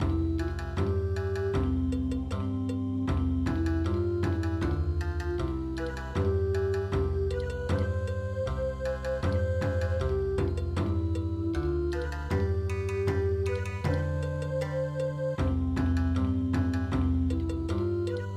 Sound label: Bon Odori; Miyako komori